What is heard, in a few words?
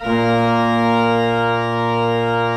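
Pedal A#2 Left, church organ; Best Service; Advanced Media Trax Modern Composer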